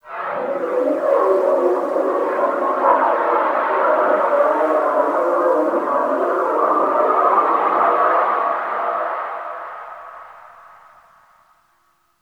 Forest Moan Wind; Warframe; Grineer

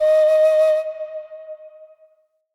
Flute D Sharp; Player Instrument

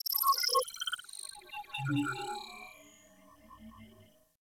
Beep Short, Ambience, Interior; Warframe; Orokin

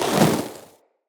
Elemental Fire Arrow Shot, Bows; Weapons

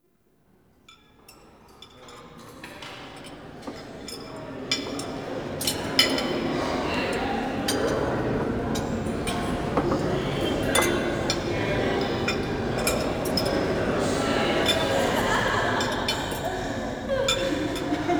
Diorama Happiness SFX; Warframe; Duviri; Dioramas

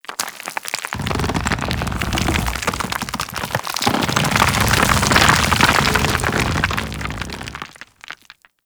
Golem Melee Death Retract; Warframe; Foley

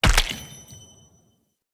Player Bow Headshot Kill; Weapon Impacts; Sweeteners

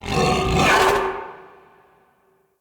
Battle Dog Enemy Spotted; Animals; Vocals; Snc Battle Dog

